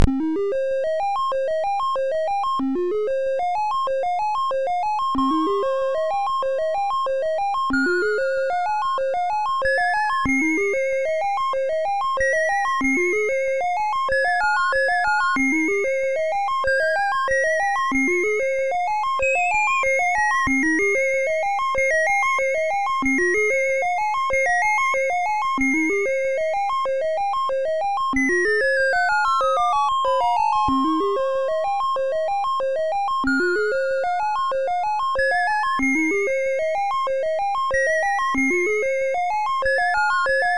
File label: C64 Piano; Weird Dreams